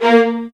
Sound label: VIOLINS, Synclavier Strings; ILIO